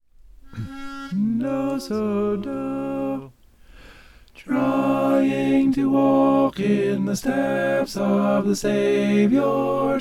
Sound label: stepping in the light tenor